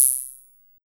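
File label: NORD open hi-hat; Drums In Your FACE!; BONUS HATS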